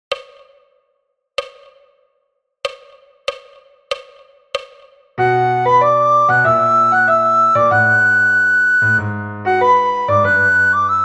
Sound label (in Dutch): sopraan rustig; Flierefluiter 7